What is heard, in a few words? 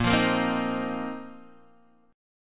Bb Suspended 2nd Suspended 4th, Guitar, DADGAD